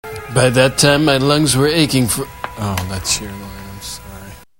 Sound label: lungs